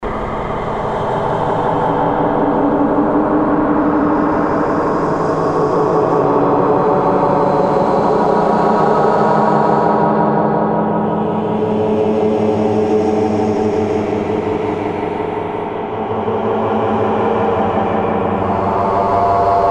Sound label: Scary Sound; Mark DiAngelo